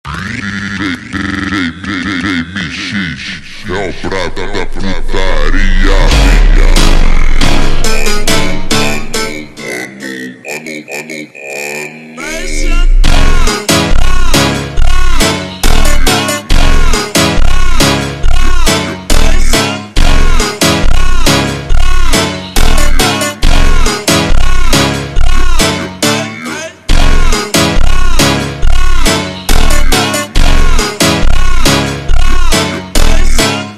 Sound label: Montagem extreme Slowed